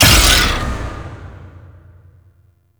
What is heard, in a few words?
Heavy Block Ability Burst; Warframe; Duviri; Dax Heavy; Abilities